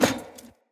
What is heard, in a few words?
Heavy Grunt Boot Walk Metal, Footsteps; Enemy; Sinecure